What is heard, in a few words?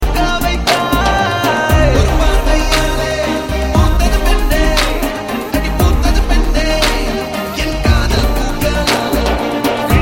Vocals